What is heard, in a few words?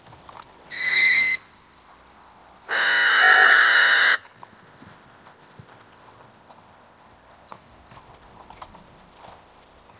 Horse neighing; MAGNeT audio-magnet-medium